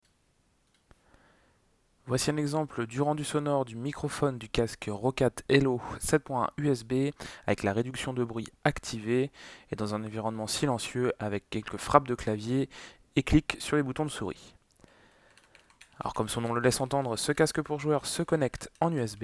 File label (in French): usb microphone, clavier souris reduction bruit; roccat elo 7.1